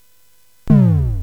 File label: Dark Castle, CSG8580R5